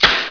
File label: Snap; PC; MARIOSFUNDAMENTALS